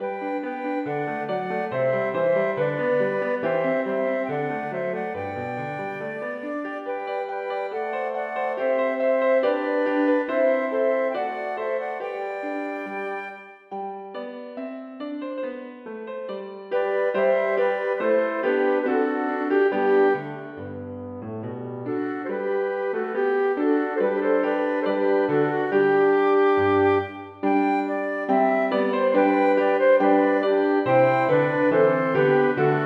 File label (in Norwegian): Engels Dantz for to fløyter, horn og piano